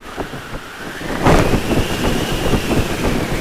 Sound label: skirt blow; deplume